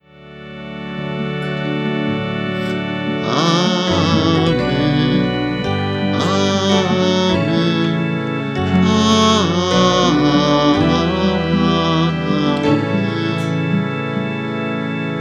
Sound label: Amen